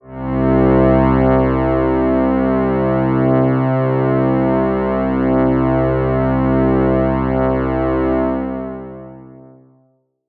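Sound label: strings C2, PPG Wave 2.2 Pads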